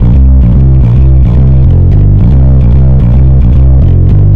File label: Bass, Distorted C; Bass X